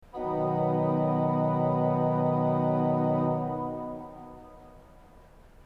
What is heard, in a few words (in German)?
ton, orgel